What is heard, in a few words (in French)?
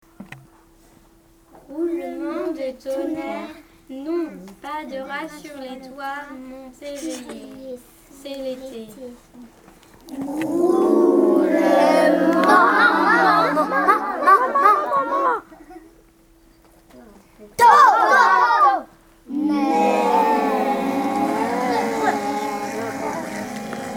roulements de tonnerrre